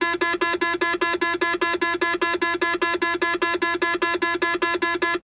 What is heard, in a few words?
Truck Land Rover